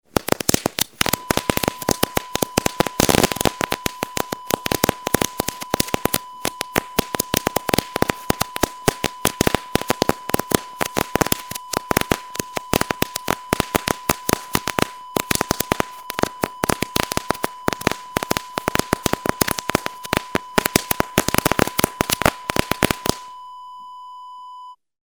firecrackers

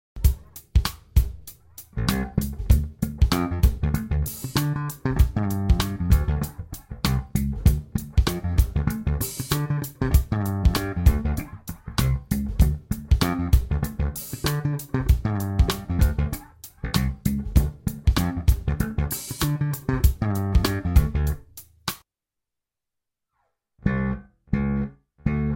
Swing slap in Dm

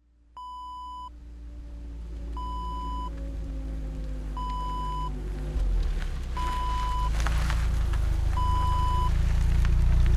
car drive up reverse shut off

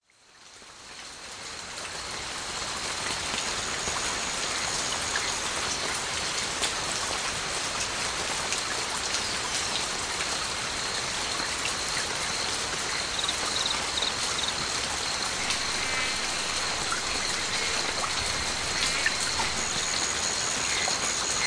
Natural SoundScapes Amazon Adventure stereo

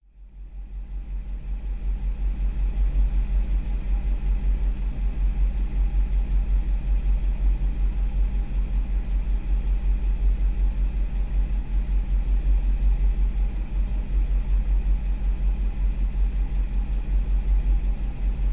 Truck Idle Interior V8; one hour

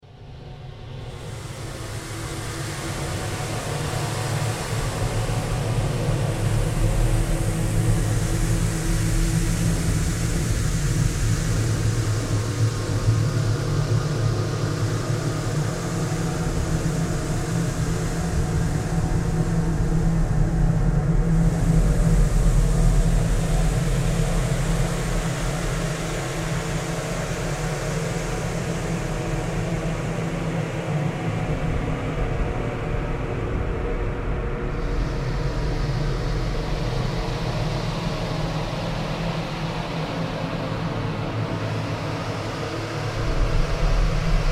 Sci-Fi SFX

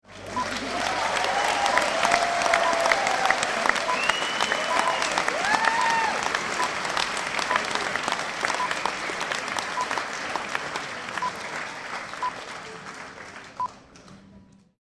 Applause

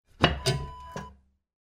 wood burning kitchen oven door open

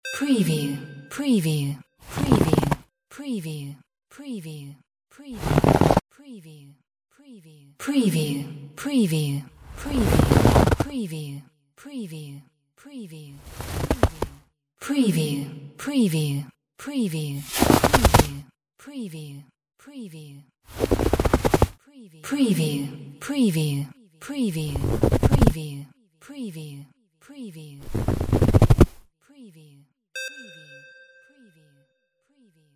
FOOT SNOW DEEP

